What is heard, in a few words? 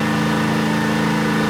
Engine Jeep Rpm Med